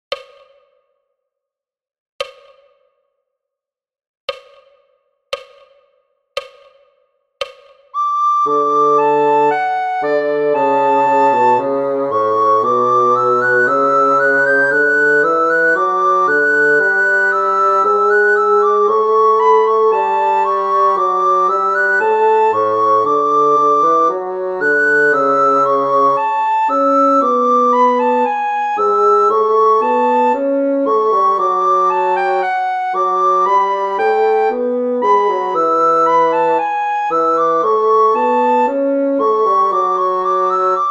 sonate sopraan; Loeillet

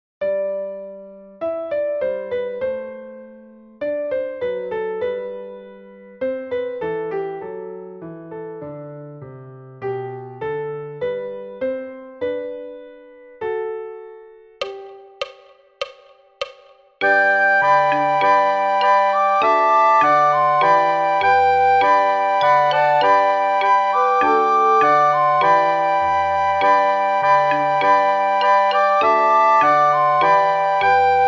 sopraan; Flierefluiter 5

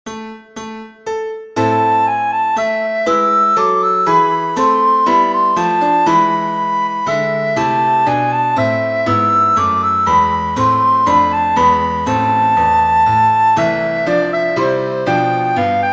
Menuet sopraan; purcell; Blokfluitles in Utrecht

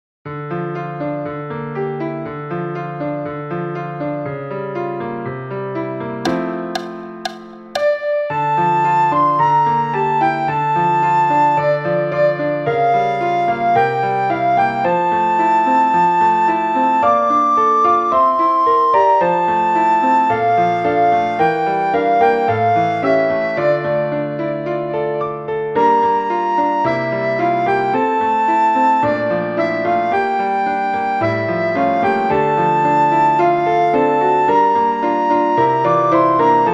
sopraan; Blokfluitles in Utrecht

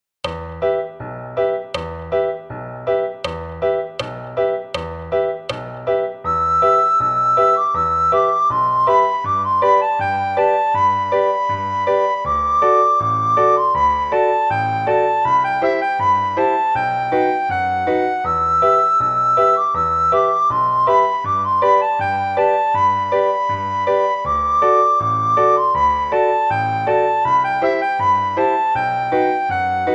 sopraan; Flierefluiter 5

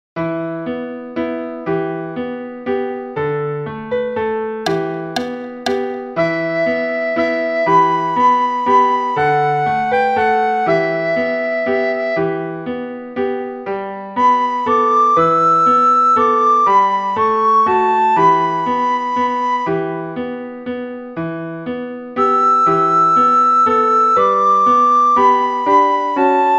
sopraan; Blokfluitles in Utrecht

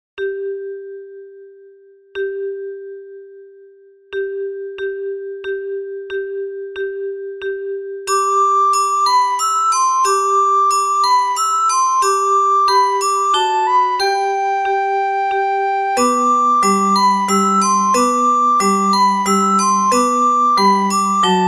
sopraan; Flierefluiter 5

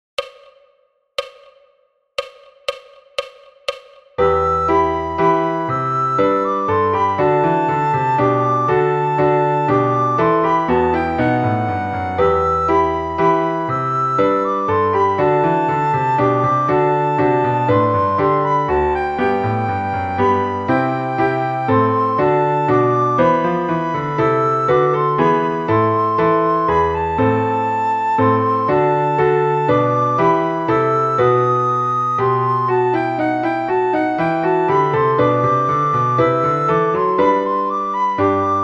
sopraan; king Arthur; Blokfluitles

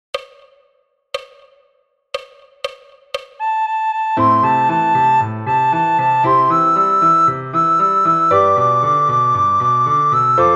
sopraan; Flierefluiter 5

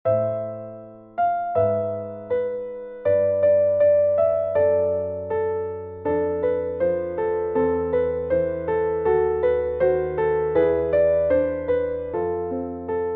sopraan; Blokfluitles in Utrecht

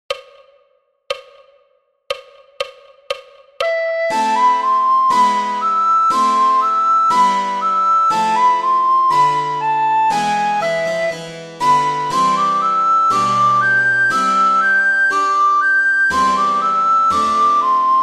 Jumping frog sopraan; Flierefluiter 5

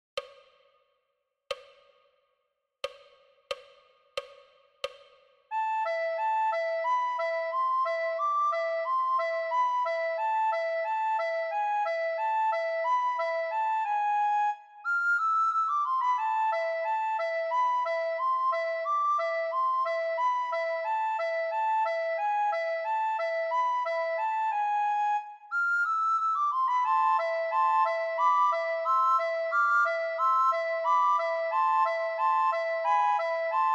sopraan; wednesday

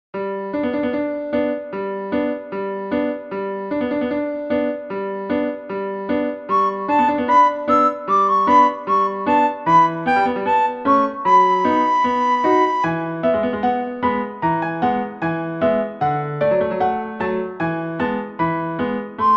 sopraan; Blokfluitles

